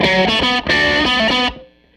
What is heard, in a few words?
Tele Guitar Loop